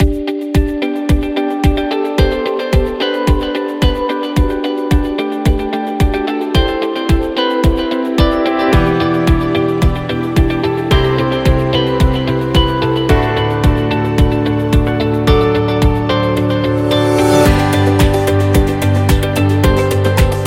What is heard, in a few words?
Corporate; corporate music vol 14